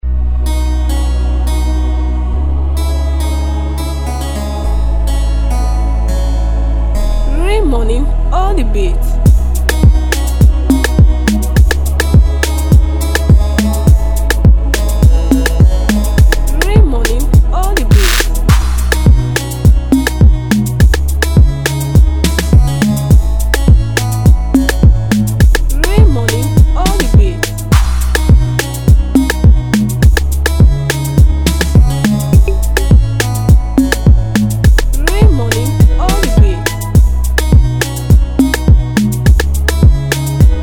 Beat Type

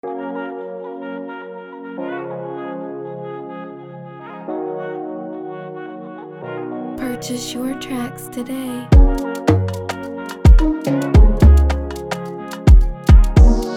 Type Beat, afro banger instrumental

